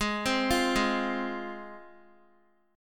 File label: Ab 6th Ab, Guitar; x,11,10,10,8,x; Ab6 arpeggiated